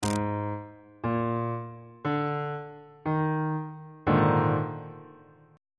d sharp major 7th suspended 4th 1st inversion on bass clef